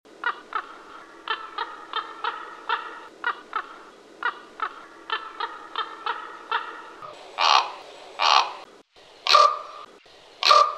kolkrabe

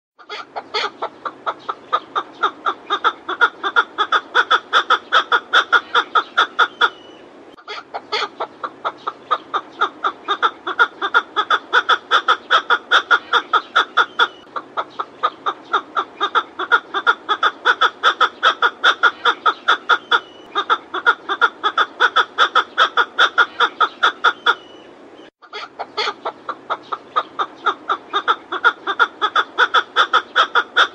Steinhuhn Ruf

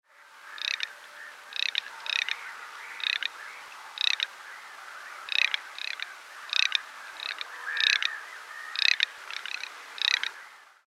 knaekente rufe

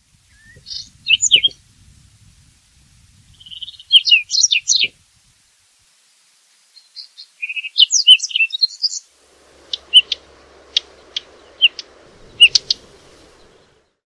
Gesang des Braunkehlchens; Vogel des Jahres 2023

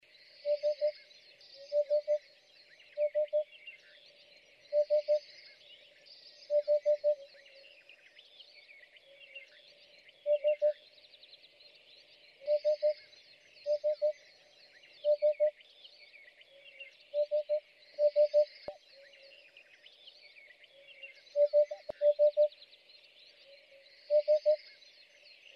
wiedehopf

